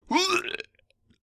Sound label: Guy throw up